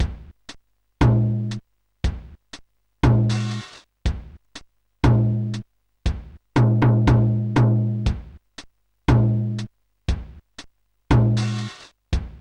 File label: Kit Five, Sonic Drum Kit